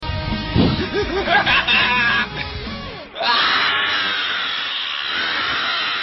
gaaaaa

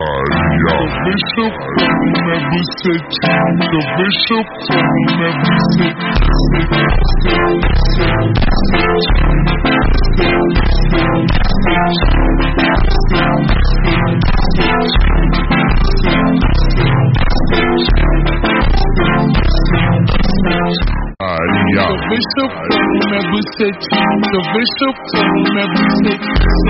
phonk

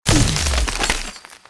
ice lazer

